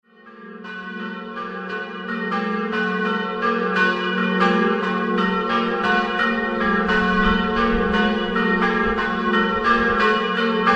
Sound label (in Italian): MUSI, campane